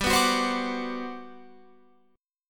G Major 11th, Guitar Open F; strummed; JGuitar

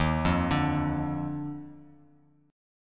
D sharp Major 7th Suspended 2nd, Bass Standard; arpeggiated; JGuitar

